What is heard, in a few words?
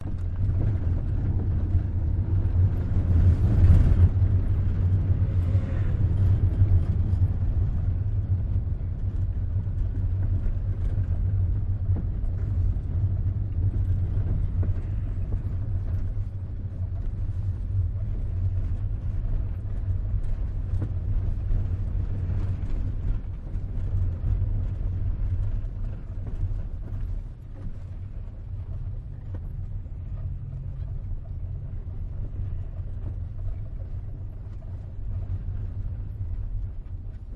taxi ride with walkie talkie conversation; la paz; your free sounds